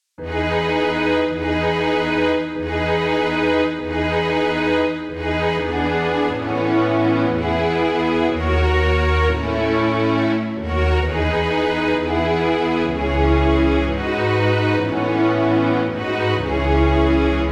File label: piano